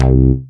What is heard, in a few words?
ACID BASS, ROLAND JV80; Rubber Chicken Software Sample Bank